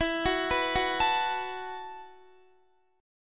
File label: E Suspended 2nd Suspended 4th E, down, Guitar; Esus2sus4; arpeggiated